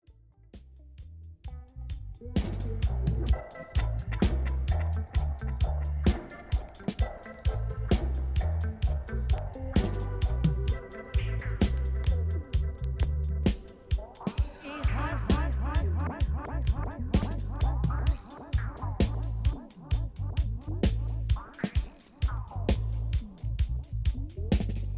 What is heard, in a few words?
Dub Message